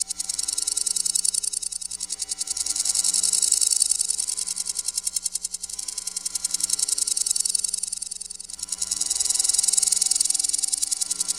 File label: Clicks Multi Tapped